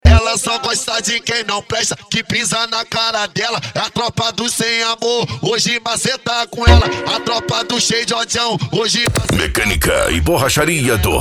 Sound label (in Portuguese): funk ritmado